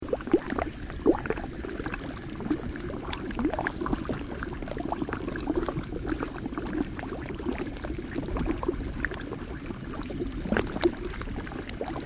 Volcanic Mud Pool Bubbling Loop, Dormant Volcano Japan; Volcanic Mud Pool Bubbling Loop 02; Environmental; Nature